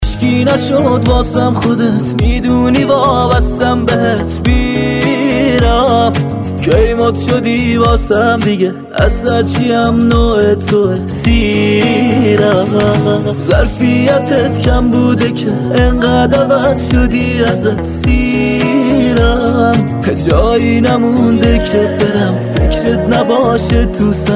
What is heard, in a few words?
Mobile ringtone